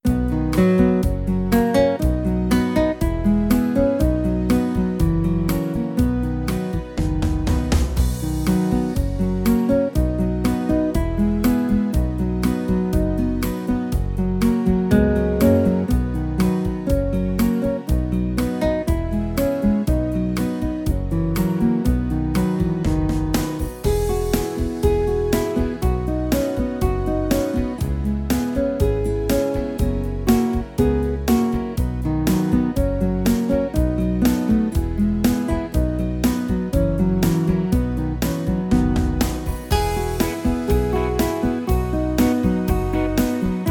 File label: the old testament books song accompaniment only